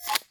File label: Menu Open Container; Custom UI Sounds; Call of Duty Black Ops 3